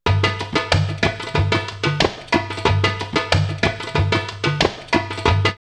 MorroCanCan 92, drumloops World Ethnic; X-Static Goldmine

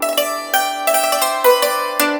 YANG CHING, Heart of Asia; Spectrasonics

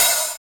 HH, REAL

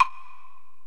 Korg x5, Drums

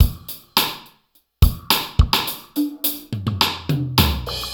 Drums

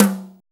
ethno tom hi; Drums In Your FACE!

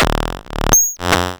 Clap Modulator; Cwejman S1; Cranky; 300 Drum Machines; DevSnd Cwejman S1 - JK

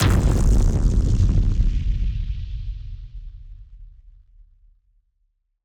Hit Splosion; musicradar; bomb factory

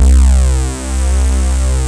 SAW SYNS, JV 1080; Pro-Rec - Synthsonic

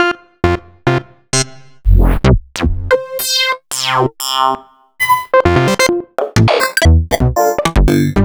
Synth Shots

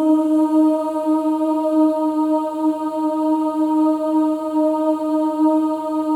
OO D#4, WOMEN; Spectrasonics

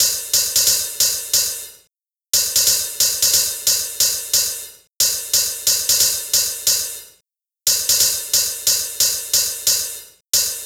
Hi Hat; Sonic Foundry; Sony Creative Software; Hydroponic Hip-Hop; Beat Components; Hi Hat Cymbal Patterns

